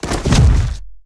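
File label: creature bodyfall large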